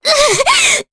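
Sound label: Damage, Japanese; voices; heroes; Maria; kingsraid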